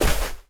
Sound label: sand, step; Minecraft; Conquest Default